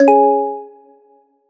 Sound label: message received